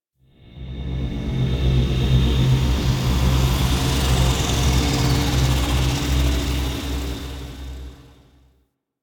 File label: Exterior Windy Passby, Ambience; Warframe; Rail Jack